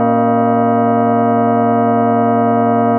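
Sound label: cloche, bell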